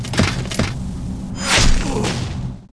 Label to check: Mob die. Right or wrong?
Right